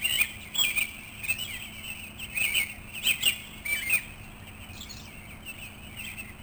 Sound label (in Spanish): pajaro